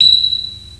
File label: sonar